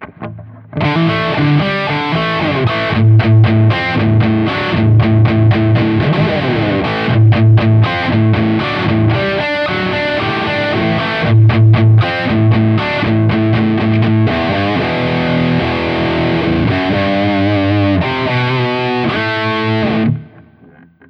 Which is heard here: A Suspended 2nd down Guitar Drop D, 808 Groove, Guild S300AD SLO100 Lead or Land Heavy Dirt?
Guild S300AD SLO100 Lead